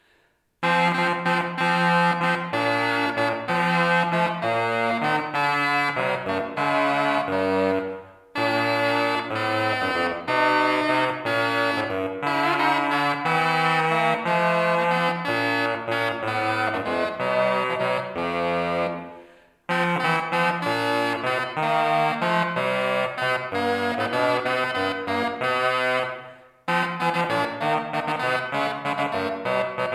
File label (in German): cornamusen